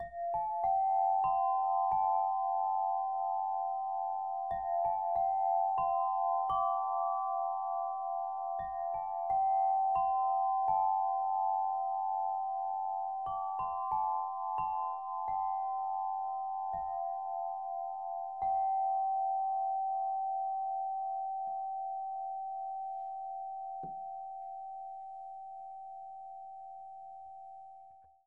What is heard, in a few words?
Light Crystal Harp Melody 432Hz; Lekka harfa krysztalowa; Czakra serca F